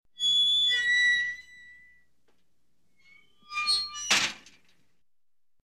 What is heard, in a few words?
Metal Gate